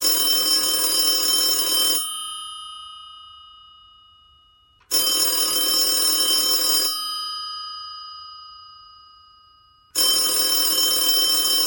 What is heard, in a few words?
Old phone